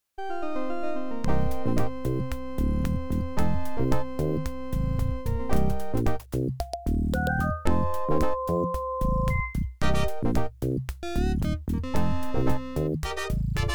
Disco

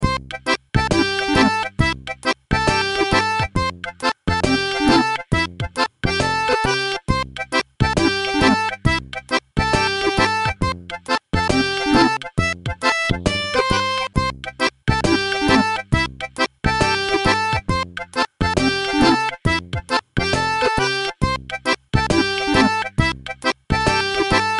Main Music

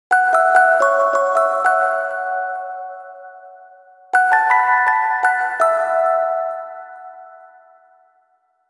Music Box; Grimoire Song; Rune Factory Frontier

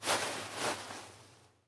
Cloth Rustle; this is the zodiac speaking 2020 gamerip switch ps4 xbox one pc macos

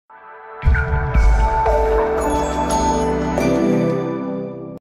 Startup; Grand Chase

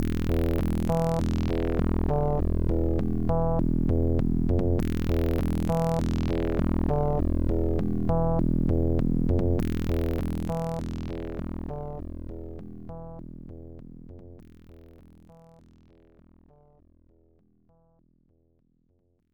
Ending Music; Final Doom TNT Evilution; ibm pc at

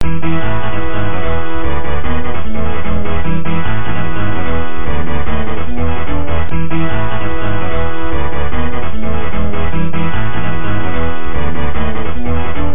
Bonus BGM; pac eight arcade gamerip 1996

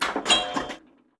Button; trivia crack

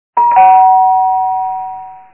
Doorbell; SimTower

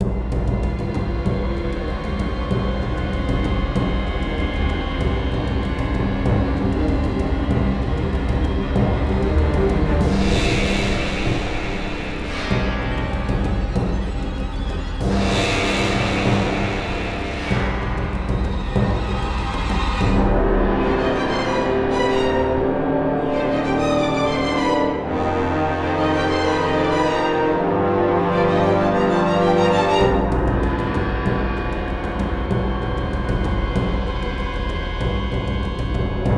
Action High Intensity

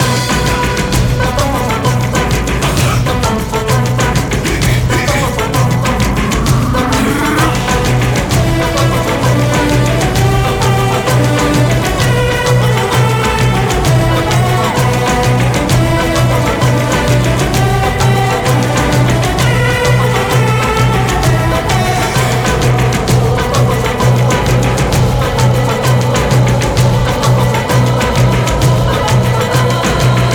Music - Winning; Tiki Turbo

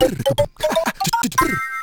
Jingle Level Completed; Mister Slime

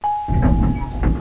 Elevator Open

